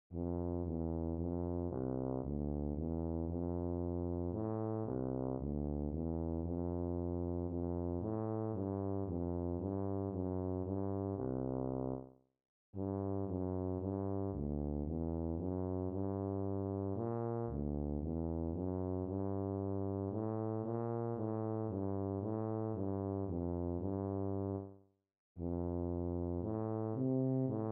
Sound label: MS Tuba C slow, etudes; TUBA Lyrical; Region 23 Middle School Etudes for 2026-27 (Year C)